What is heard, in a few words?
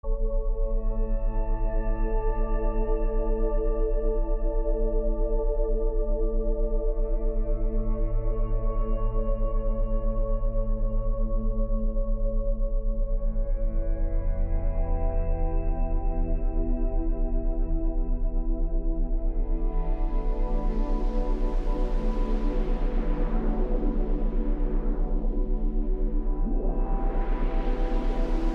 Binaural beat